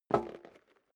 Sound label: step dress boots metal dry single stair up, footsteps